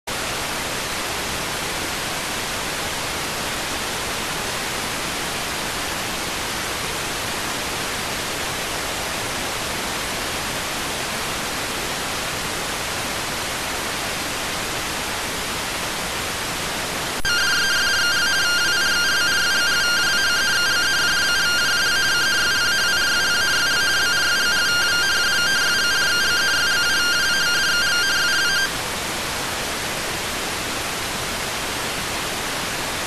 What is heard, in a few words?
rtty rx